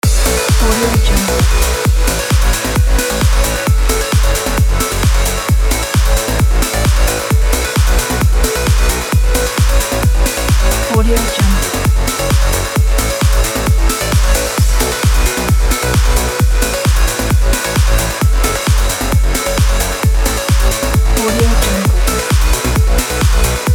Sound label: uplifting trance loop